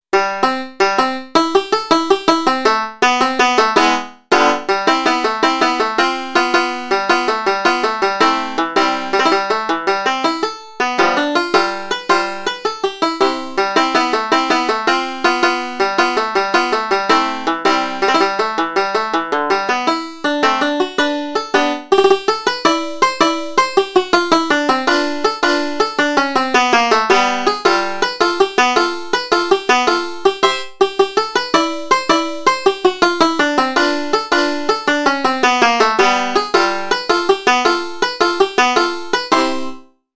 Marche Chromatique

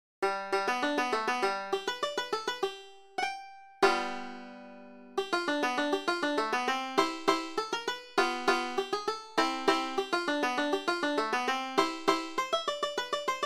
Electra Polka

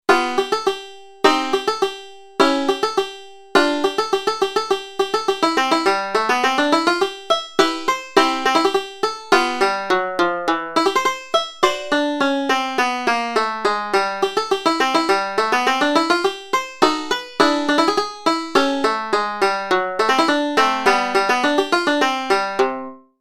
Musketeers March

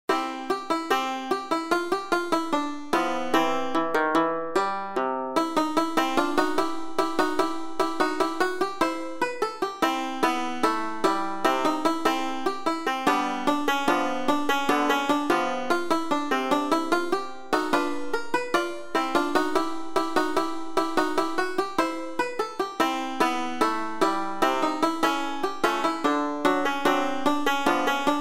Powder Rag

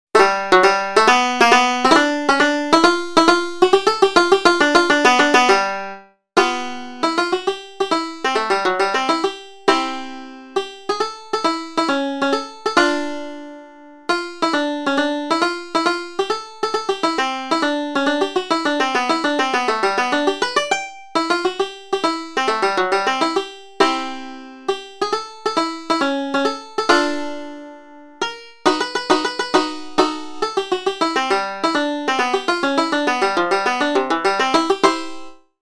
Fairyland Schottische

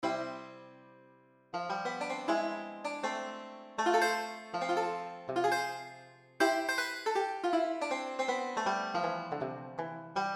Banjo Boogie

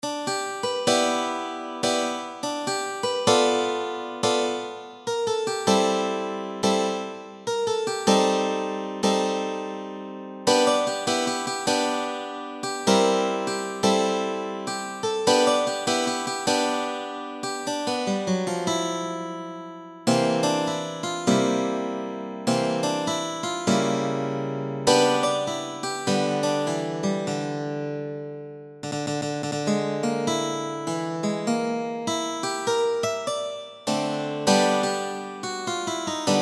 Maccaferri Blues

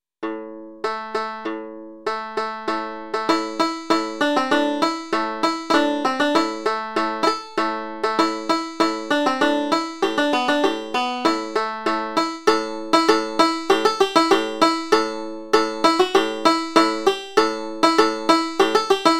Bagpipe Patrol

